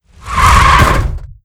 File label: car stop